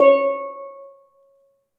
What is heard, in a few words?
Steel Drum Db5